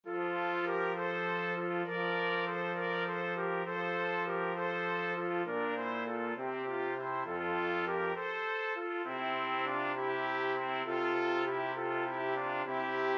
pastorale, 2 trumpets trombone

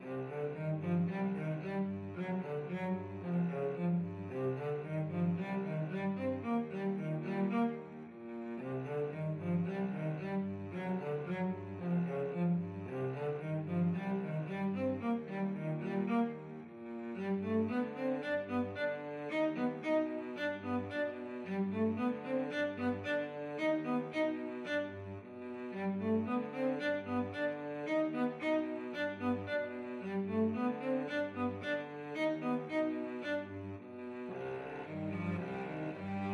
cello duet

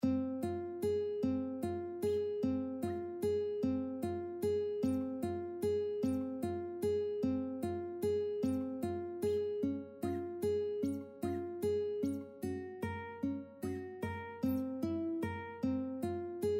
ukulele

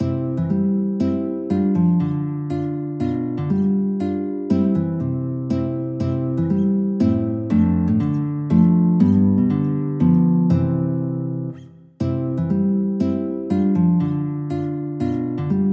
Waltz guitar; Aguado

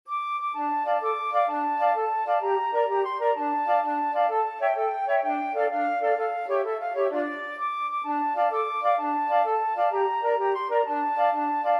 tarantella, flute quartet

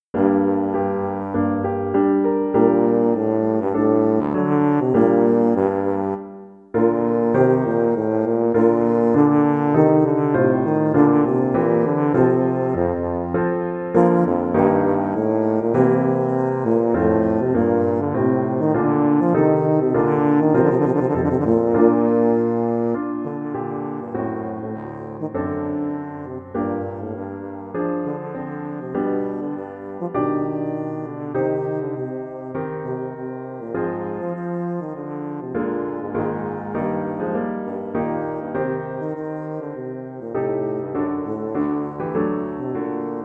sonata, tuba

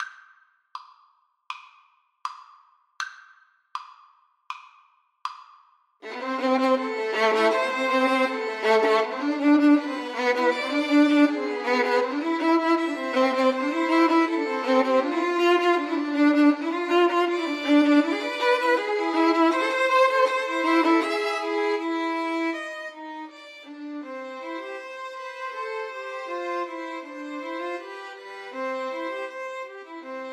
confutatis, Violin Trio

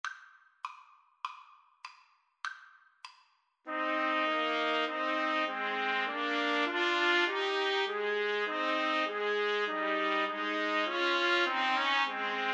TPT trio, Trumpet Trio

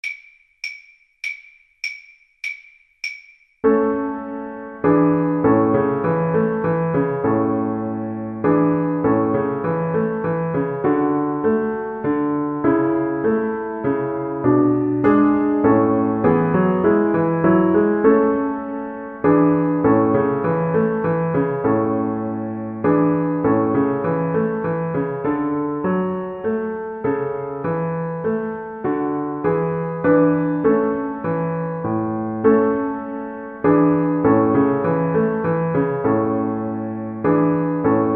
bach minuet a kar, Flute

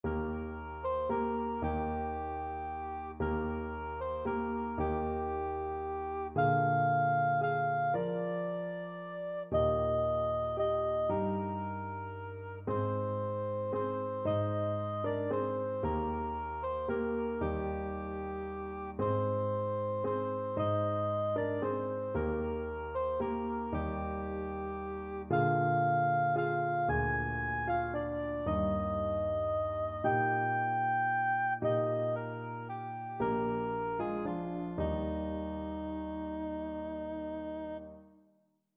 silent night soprano saxophone

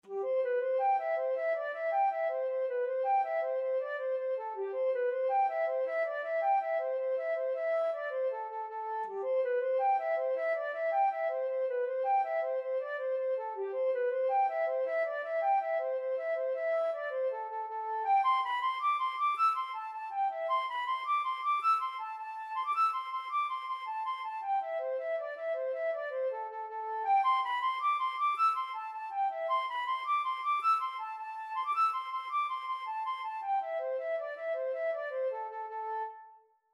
flute

